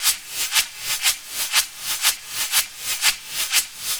SHAKR 120BPM; Bolder Sounds - Granular

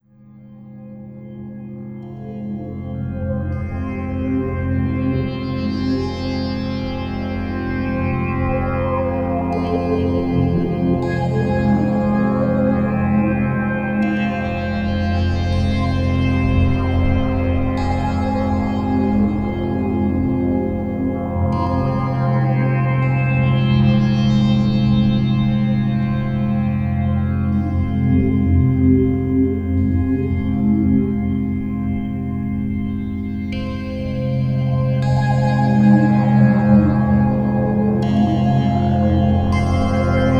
Minimal Bed; Beds; Sonic Foundry (Sony Creative Software) - Slow Silhouette

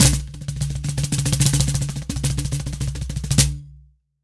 drum, mixed drums, World Instruments

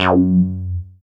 SWEEP BASS; Masterbits; Dance Trax

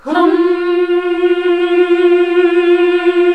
HMM F#2, classic vox

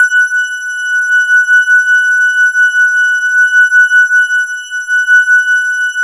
E-HARP, DX HARP; Wizoo - Powered DX

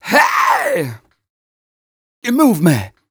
m 130 mel d-flat, Vocals

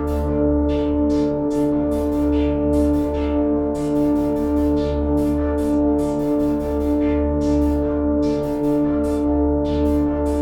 ambient pad

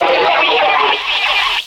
SFX, 180 BPM, Drum & Bass; Ueberschall; Drum & Bass Flash Trackz

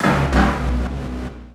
Ambient FX, Ambience FX

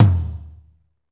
tom, Jazz; Northstar - Drumscapes Roland

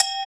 Cowbell; Equipped Music; Loungin House

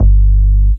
KICK, Hip Hop

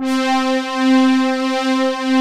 BRASS PADS; Pro-Rec - Synthsonic

